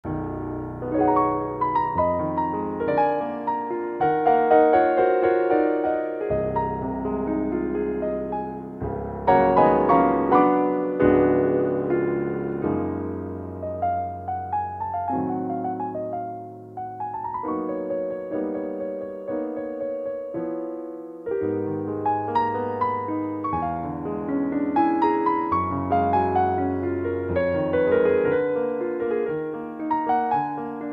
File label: Stereo Dynamic Piano; k2600; ROM Option